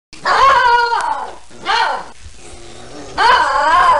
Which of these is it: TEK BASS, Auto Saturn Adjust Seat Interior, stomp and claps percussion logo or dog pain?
dog pain